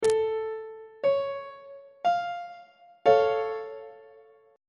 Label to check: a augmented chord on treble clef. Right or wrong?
Right